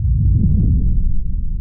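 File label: Ambience Sci-fi Energy Loop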